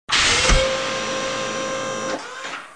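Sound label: door open; Babylon 5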